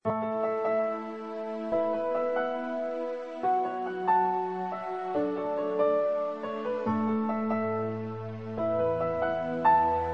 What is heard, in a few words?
romantic